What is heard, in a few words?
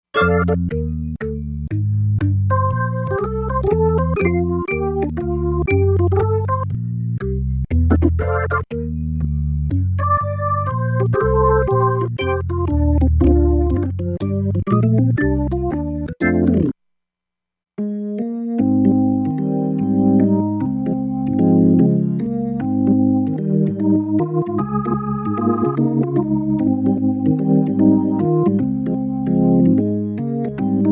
Jazz Organs